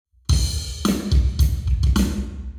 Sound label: Hall Drums 90deg